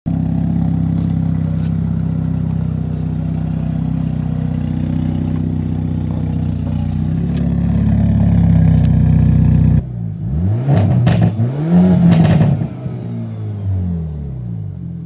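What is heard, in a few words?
Hyundai Elantra, exhaust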